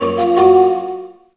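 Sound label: click menu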